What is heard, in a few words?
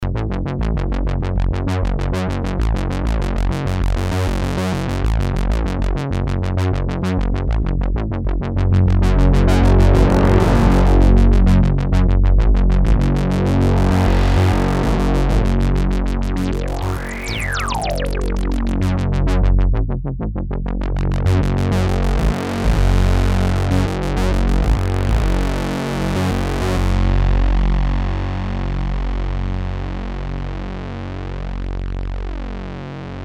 bassline; Creamware minimax